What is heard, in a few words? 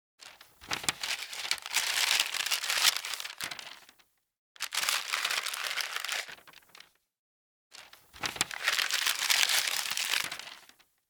Paper Rustling; blindside